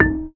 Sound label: GAMBANG D#4; Spectrasonics; Heart of Asia